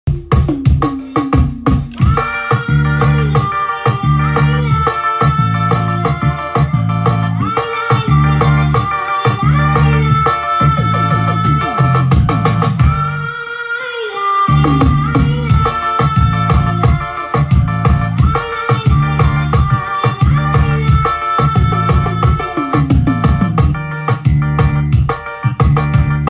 piseiro abertura

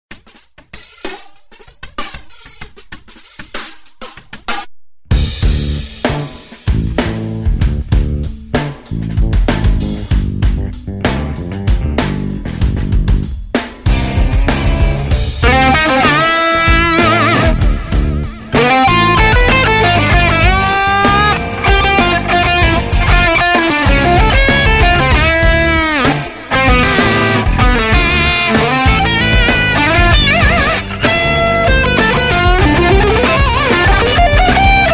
guitarra improviso funk fusion

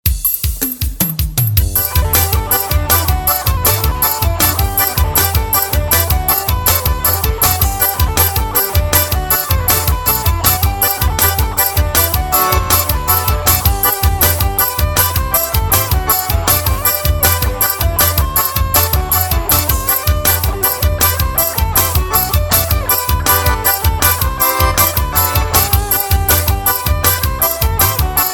rasta pé solado na guitarra

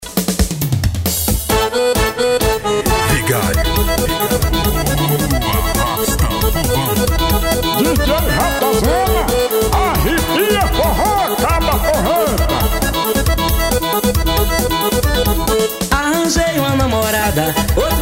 forro das primas

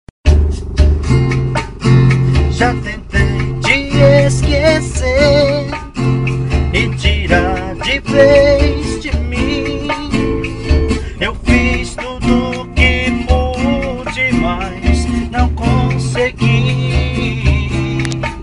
forro

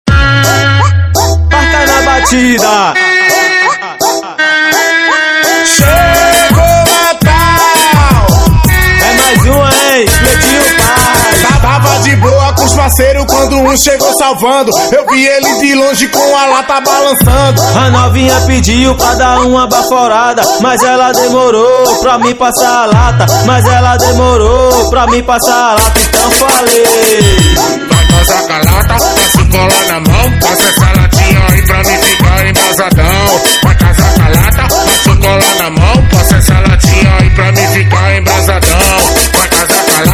brega funk

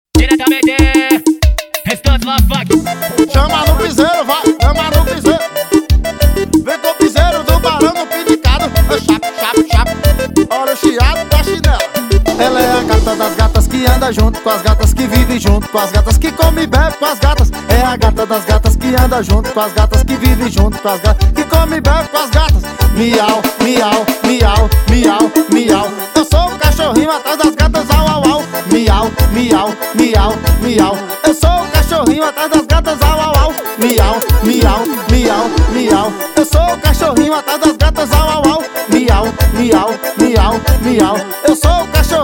piseiro

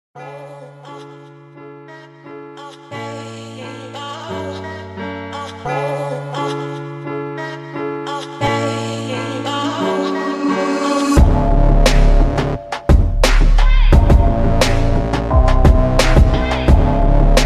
rap

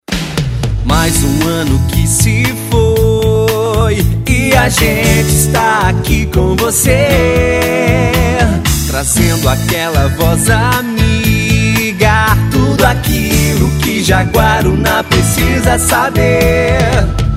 jingle; sambaqui

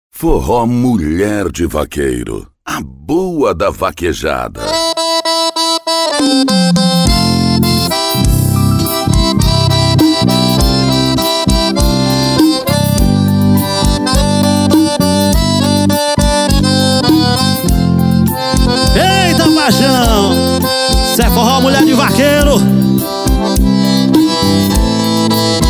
Forro

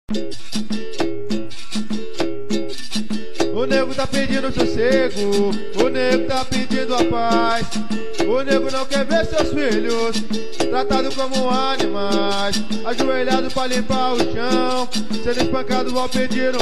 capoeira

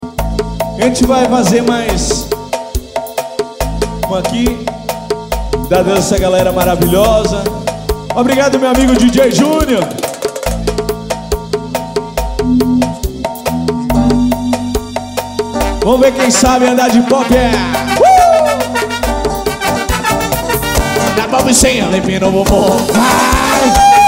arrocha

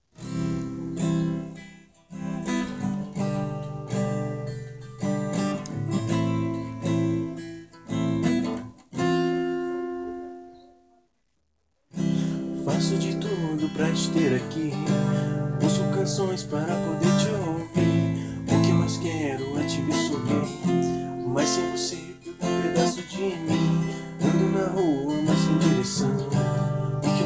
voz e violao

